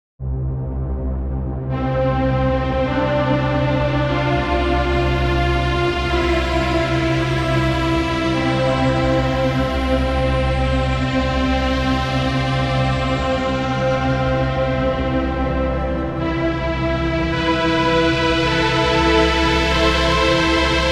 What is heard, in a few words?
Synthesizer (after Little EQ)